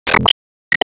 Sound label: Team Skill Ball Flash